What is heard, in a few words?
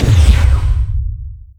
thawing